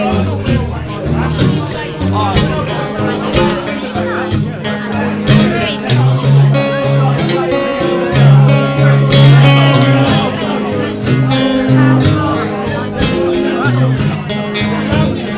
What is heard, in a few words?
blues guitar in london pub